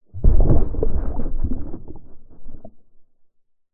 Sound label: foley heavy item place down underwater pov; zapsplat